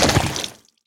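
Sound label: zombie body impact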